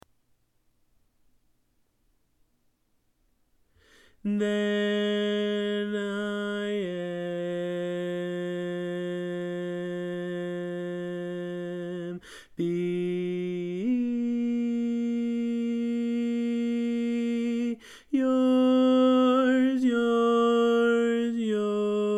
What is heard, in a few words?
Bari, barbershop tags